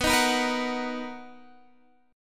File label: B Major 7th B down Guitar DADGAD